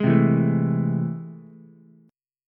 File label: A Minor Major 7th, down, Ukulele; strummed; AmM7; JGuitar